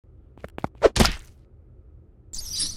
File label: Judo Throw; Fleeing The Comples